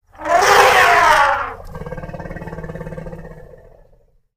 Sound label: elephant bellow